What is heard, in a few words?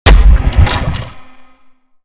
Die Hit Goo; creatures organic; Lego; Strombie